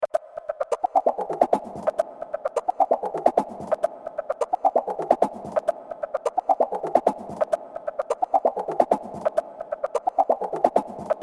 Wild Warped Techno

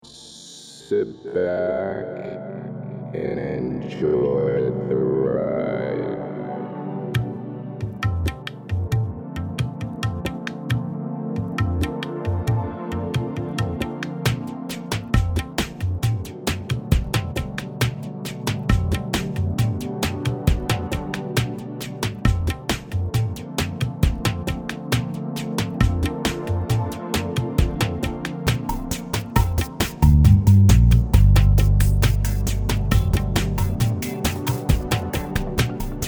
chill dub vibes

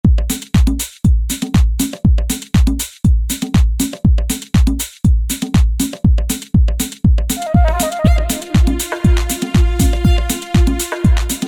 flute

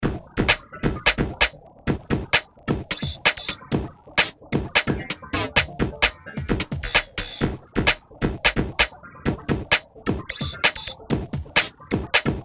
Electro Groove

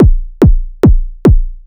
psytrance kick

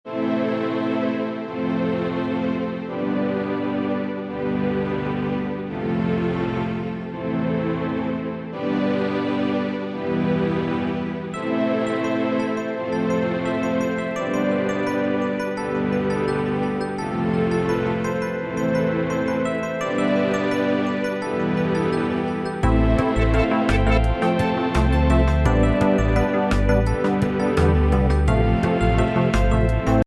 Harmony

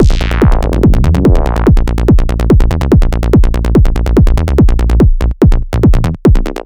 psytrance full loop

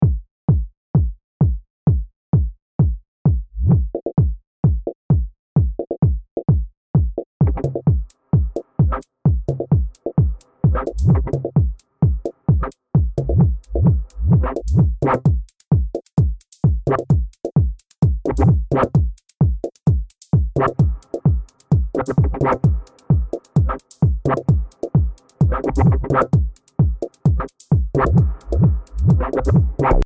Raw Electro

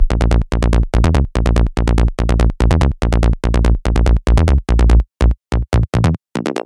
psytrance bass

